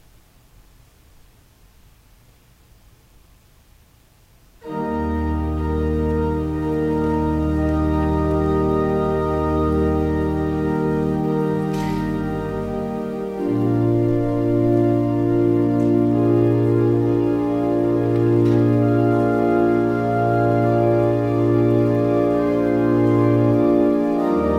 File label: Organ Music; The Desire of the Spiritual Bride; song of solomon 1 2